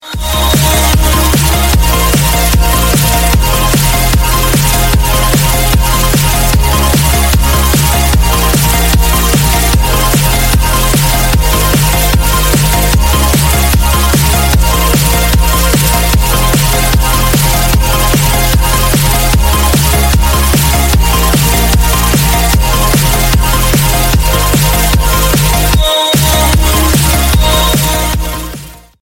galaxy s8 ringtone; android

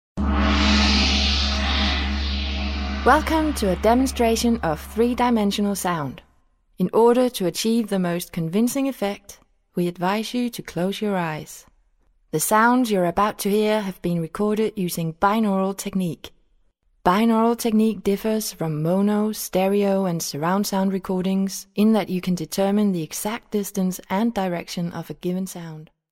3d sound ringtone; android

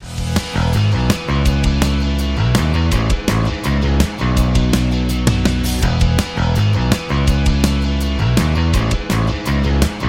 rock ringtone

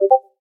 Discord Notification ringtone

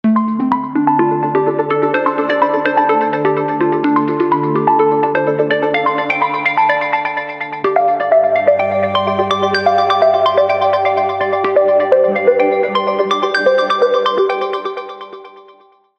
verdant lotus pond ringtone; oneplus

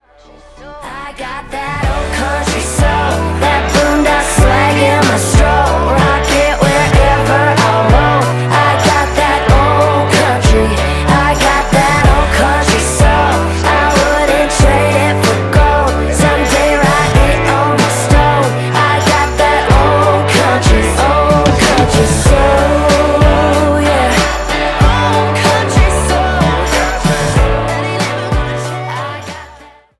old country soul ringtone

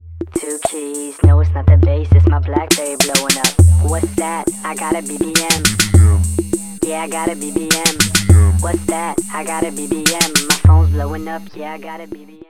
2keys bbm ringtone; android

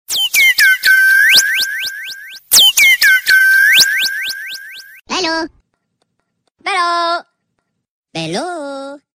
sweet bird hello ringtone; android

